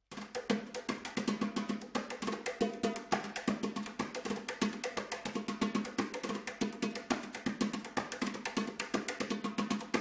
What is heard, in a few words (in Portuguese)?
repique de mão